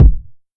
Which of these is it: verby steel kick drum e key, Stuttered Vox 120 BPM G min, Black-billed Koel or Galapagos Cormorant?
verby steel kick drum e key